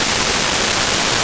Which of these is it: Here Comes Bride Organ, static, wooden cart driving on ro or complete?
static